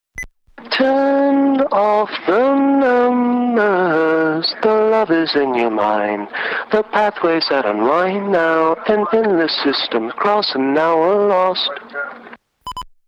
Chorus

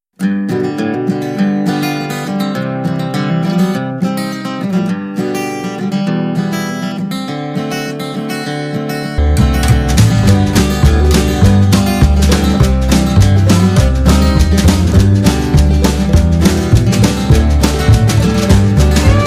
Instrumental

